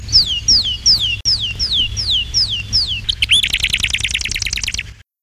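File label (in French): Mésange boréale, parus montanus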